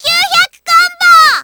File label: combo burst; Taiko AC0 v4